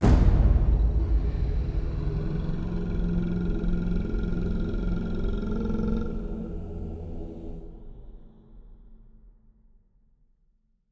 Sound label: Fail; BattleGenya